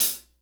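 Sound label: hi-hat close, acoustic set; drum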